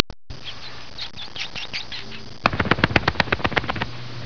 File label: bat